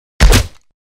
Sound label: Heavy punch combo